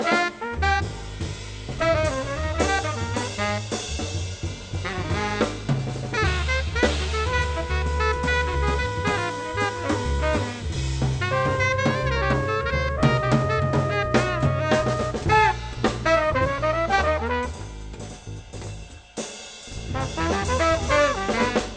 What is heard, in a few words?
jazz